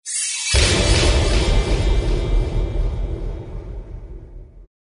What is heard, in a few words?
big drum echo